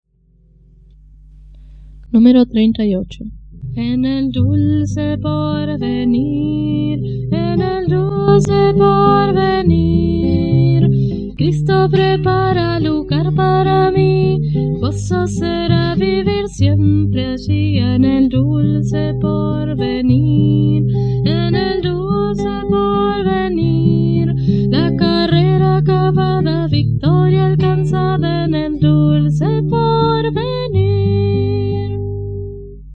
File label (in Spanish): Coro, himnario niños